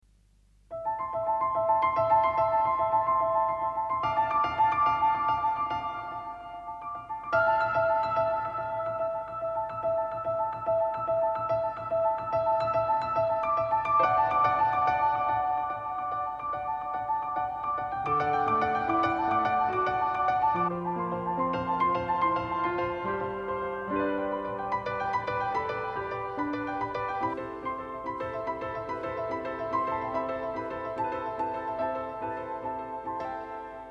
PIANO

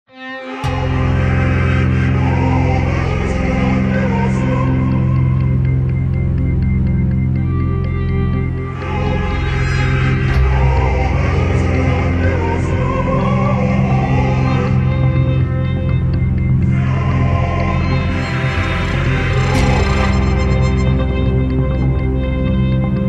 MAGICAL VOICES OF HEAVEN

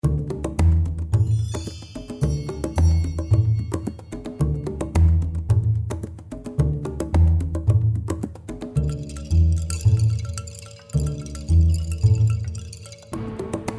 DARK JUNGLE

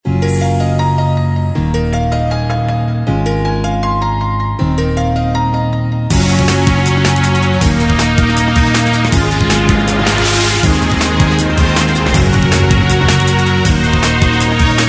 WELCOME TO DUBSTEP ISLAND

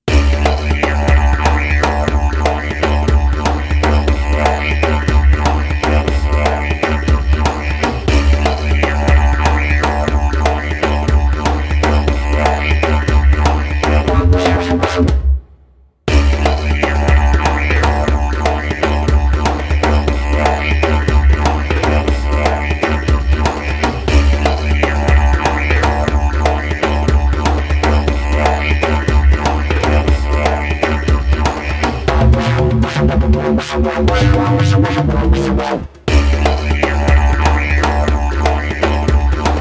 DIDGERIDOO MANIA

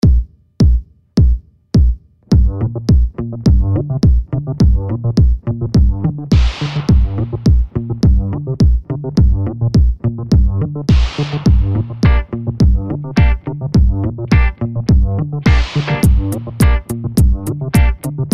DISCO ETUDE

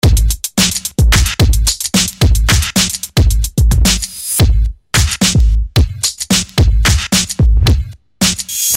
BROKEN BEAT

